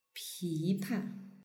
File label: pipa; yueqi